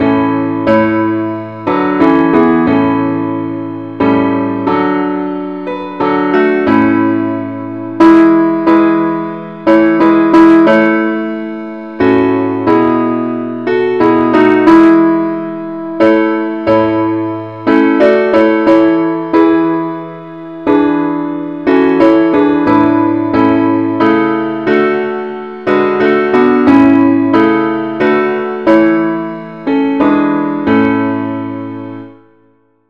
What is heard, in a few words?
parts only key a